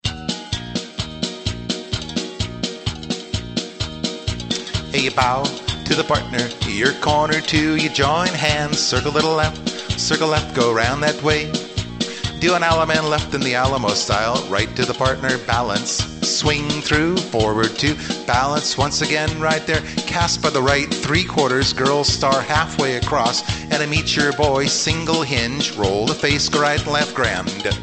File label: vocal